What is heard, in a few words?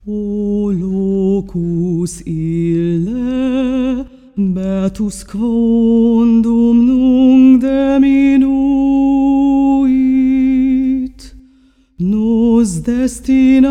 Song of Lament; Elden Ring; PS4; Xbox Series X/S